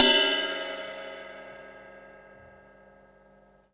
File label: Cymbal Ride; Laid Back; One Shots; Sonic Foundry (Sony Creative Software); Loops & Samples Drum Tools